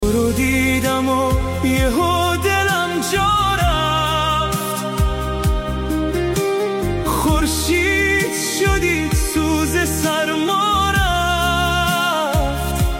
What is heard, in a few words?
music sad; آهنگ غمگین گریه آور مخصوص اینستا